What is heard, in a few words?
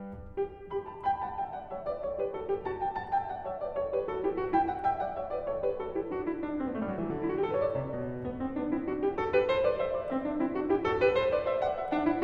Bach Goldberg Variations BWV988 Variation 23 - Allegro Moderato